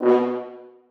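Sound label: horns staccato a#3; Sonatina Symphonic Orchestra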